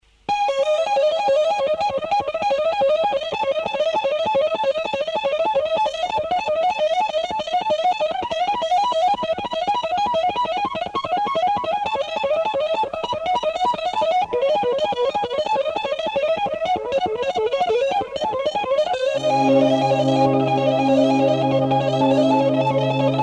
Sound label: Electric Guitar Synthesizer